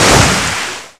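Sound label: Battle Boss skill; Etrian Odyssey III HD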